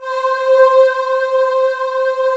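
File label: CHOIR BELL C5; USB Soundscan vol.28 - Choir Acoustic & Synth; AKAI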